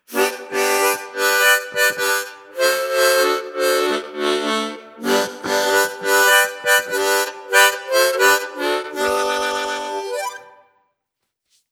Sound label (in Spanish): armonica